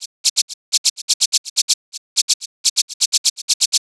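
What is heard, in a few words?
Shaker Loop 125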